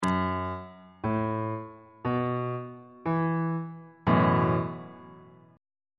a double sharp dominant 7th suspended 4th 2nd inversion on bass clef